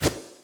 Teleport

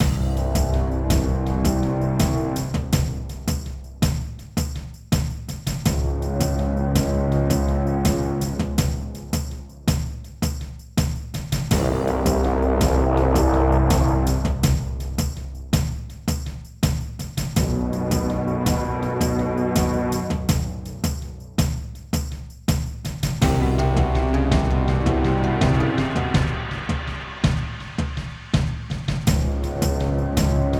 Castle Loop

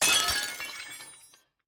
Enemy Freeze Break

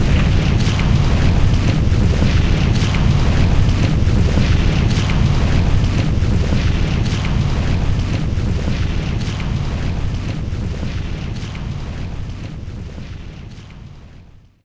Quake

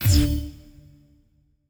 Ui Reward; Forza Motorsport 6 Apex

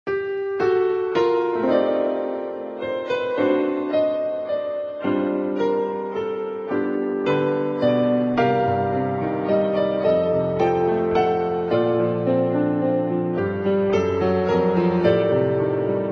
Piano Waltz Song; menherafflesia flowering abyss; android

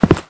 Stone footstep; starbites

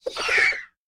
harpie attack; enemies

